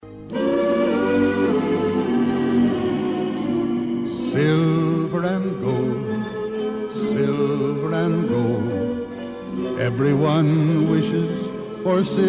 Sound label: Christmas traditional